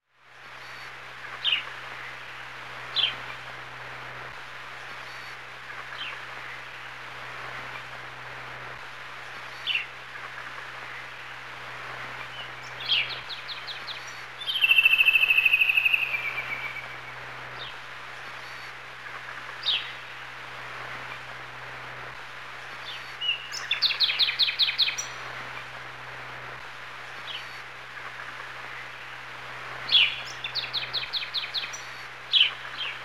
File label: AR Shot Ambience; Prototype 2; AR Games; 3DS